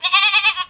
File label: goat